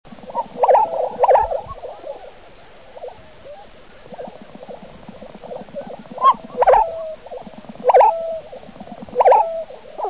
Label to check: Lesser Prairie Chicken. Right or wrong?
Right